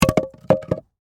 Impact Wood Plank, Drop, On, Rock, Pile; Materials; BlastWave

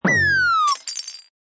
Cartoon Slip Crash; Comedy/Cartoon; slippery surface fall and crash; Funny Sound Sound Effects; Lynne Music

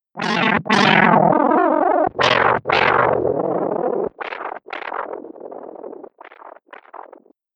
Chaotic Electronic Wind, whoosh; Andrew Fleming

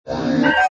Hi Tech Panel Servo Button

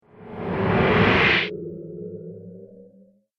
Reverse Drop Whoosh; Andrew Fleming

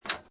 Pinball Sound Metal Plates Click; Video Games

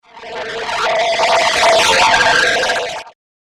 Spaceship - Cutting Through The Dimensions; Sci Fi; science fiction sound effect

